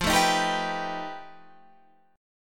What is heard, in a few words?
F 9th Flat 5th F down Guitar Drop D